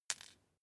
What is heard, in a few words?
paperclip drop floor